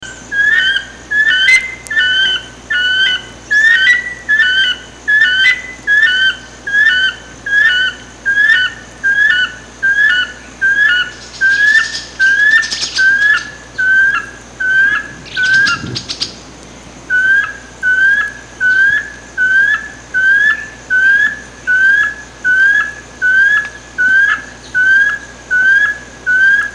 canto da saracura lisa

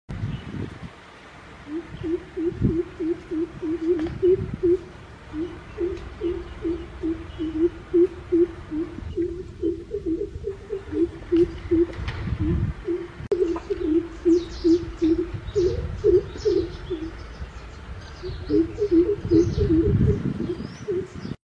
Canto do cucal preto africano

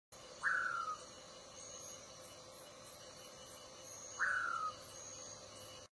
Canto do bacurau ocelado

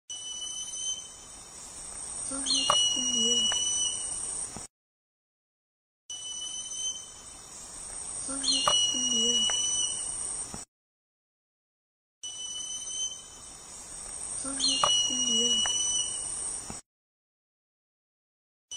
canto do uiracu falto

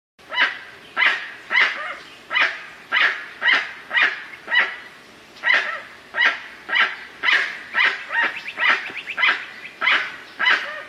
Canto do jacuguacu

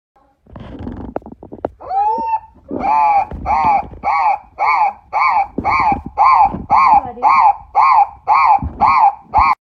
canto do turaco de crista vermelha

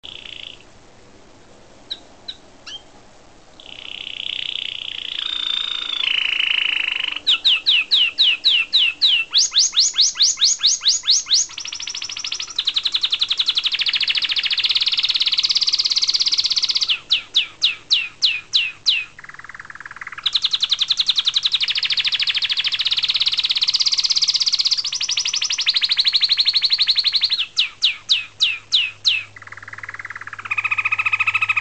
Canto do canário

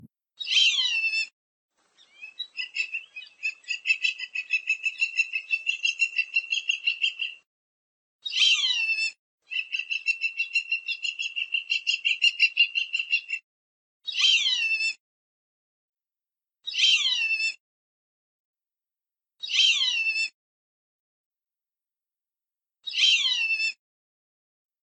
Canto do Carcará

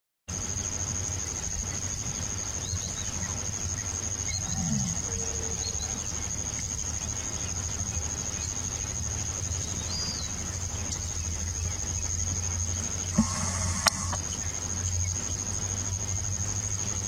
Canto do pisa n agua

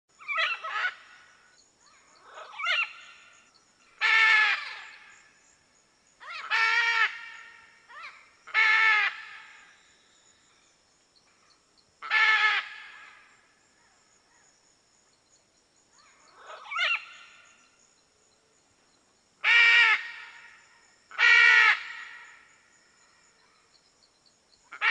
Canto da Arara vermelha

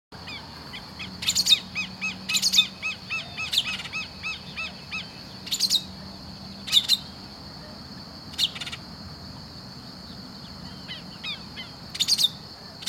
Canto do sabia de peito amarelo

